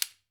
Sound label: AFRICAN, African Perc